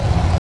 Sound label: secret door slide; Max Payne; ragnarock